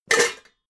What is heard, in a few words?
pots clank hard